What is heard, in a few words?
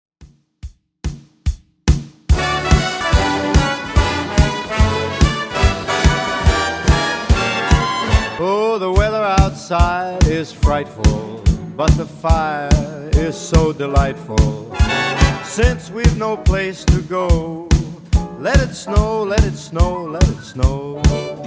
50s Christmas Redrum 144 Bpm